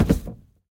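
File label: quiet soft short sound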